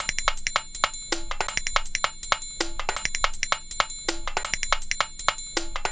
162, breaks; M8

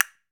CASTANET

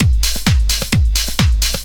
drum 130 groove; Techno Trance Essentials

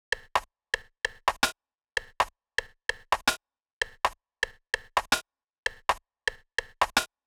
Rm-Bl Loop 130, Perc Loops 130, Loungin House; Equipped Music

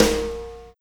Snare Shard

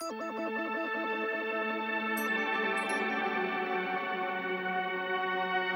STR, Hip Hop and R&B Drumloops; Best Service; ProSamples vol.01; AKAI

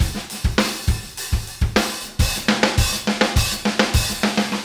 Beat Loops, 103; Zero-G; Monster Beats

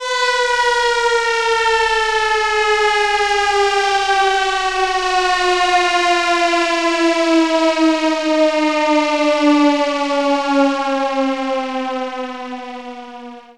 ALARM; Best Service; XXL Production Effects; ALARMS; Sample CDs

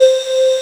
BOTTLES; Best Service; Alpine Volksmusik; BRASS+BASS

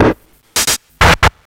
MD 909 150, Hard & Loud Techno

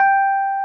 RHODES G4; Sonic Reality - Vintage Timetraveler

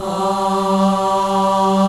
MIXED CHORUS

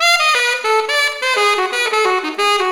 sax (jazrif); Sonic Foundry (Sony Creative Software); Ugly Remnants VOL-2; Knutrix Demo

